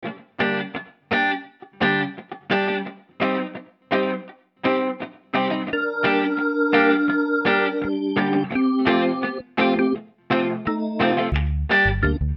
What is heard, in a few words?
reggae; songkits; Mixcraft